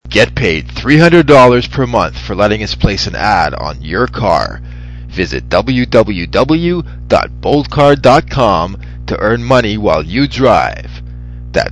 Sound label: acid punk